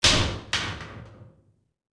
TC DOOR, door abandoned; PC Gamer IT CD 40; zones

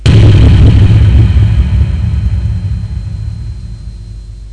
effect dominator, bdrum samples; Transcription: BOOM

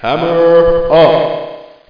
hup

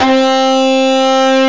dirt guitar

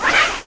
exit roll; Spider-Man CD Cardz Mary Jane Watson

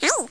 cartoon

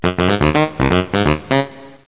saxafone; instrumental

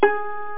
pluck, net instrument; Internet 1996 World Exposition; Brain Opera; net-music

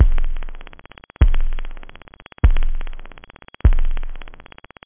stomper, techno bd; drums

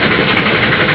Train

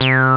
synth

